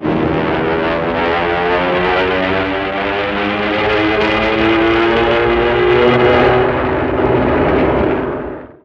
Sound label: airplane propeller crash; best service Blue Box